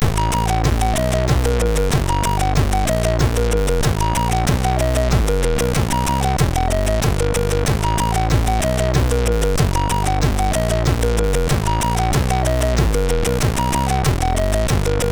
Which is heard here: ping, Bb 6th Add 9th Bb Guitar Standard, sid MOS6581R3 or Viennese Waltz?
sid MOS6581R3